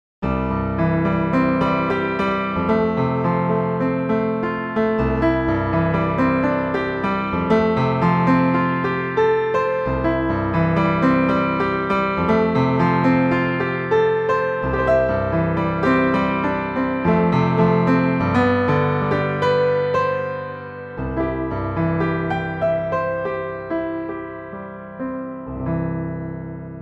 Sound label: Pythagorean, tuning samples